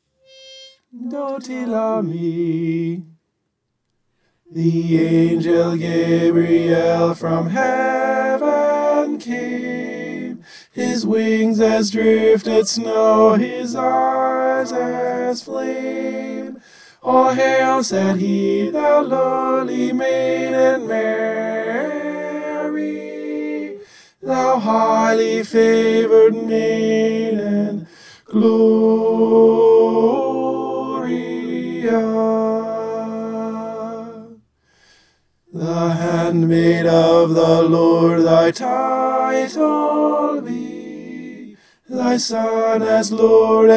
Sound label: tenor